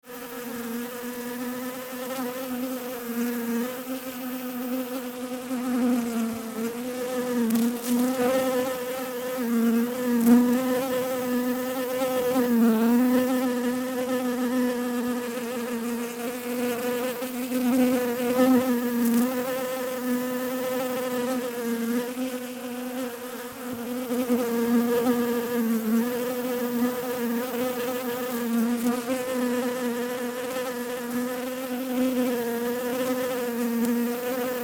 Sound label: Bee noise